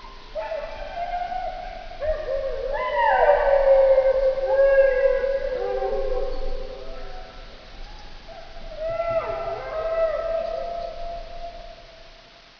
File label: western dingo